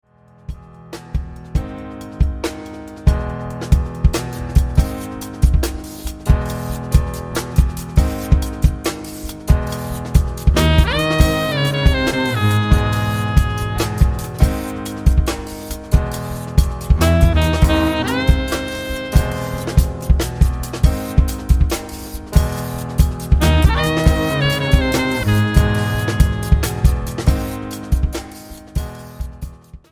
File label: Trance